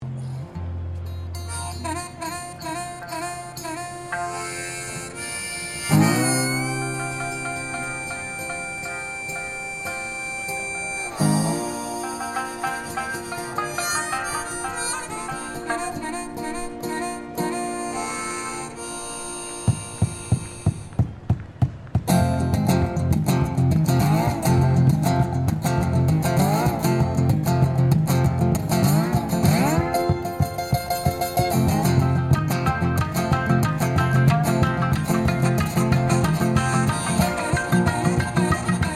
Ambience Busker Japanese Blues Guitar Shamisen

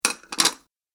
Saucers Stacking Plates, dishware

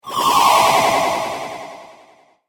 Cartoon Magic Spell

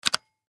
Button Switch; Multimedia Web Interactive

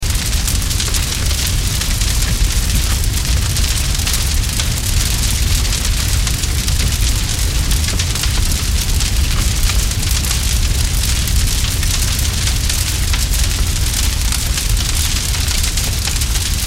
Blaze Large Heavy Crackle Loop; Fire; Flames; Objects; Flame Sound Effects

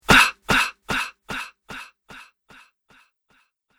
Body Blow Punch Ahh With Slow Echo; Ahh With Fast Echo; Punch And Hit Sound Effects; Human Body Sound Effect; Andrew Fleming

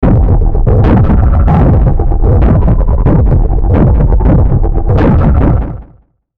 Pulse Dark Rhythm Delay; Drones (Sound Effects:Sound Design); SPECIAL FX; Blastwave

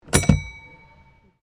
Tram Cable Portland 1800s Bell Ring; Portland Cable Tramcar; Streetcars; Vehicles

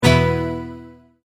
Multimedia Tone-Stop; Tone Sound Effects; Sound Design

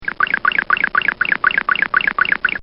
Comical Bone Rhythm; Funny Sounds; Comedy

